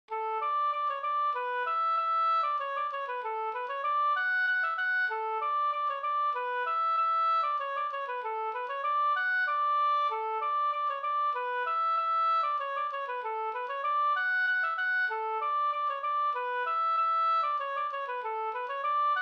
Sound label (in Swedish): polka polkett; e Katrina Lundstedt Hs